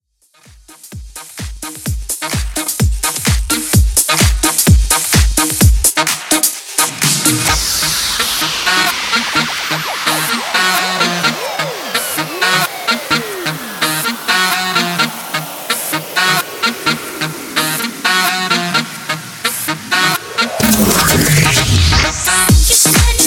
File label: Dance 128 Bpm; ReDrum